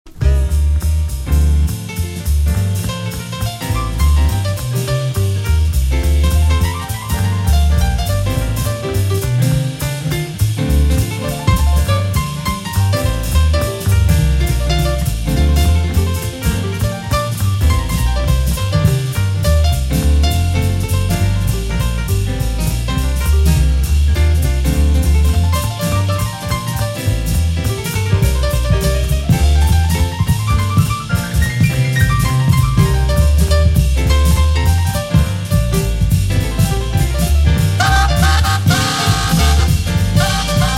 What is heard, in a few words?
groove